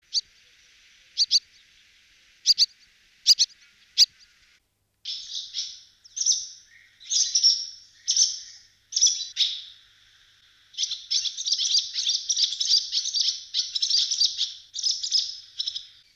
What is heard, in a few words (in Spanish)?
Golondrina comun